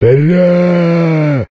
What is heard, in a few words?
zombie attack; STALKER; customnpcs